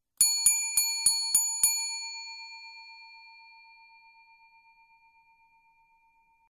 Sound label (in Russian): nastoln zvonok